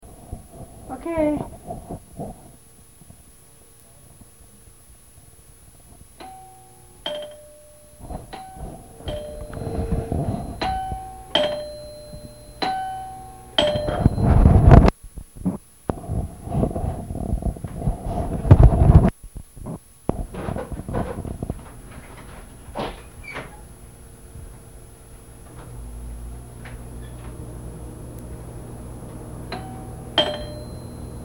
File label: doorbell